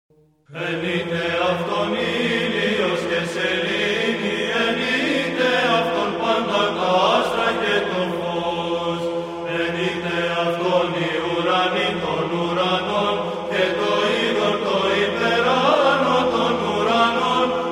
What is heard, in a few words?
Christmas hymns, Greco Bizantina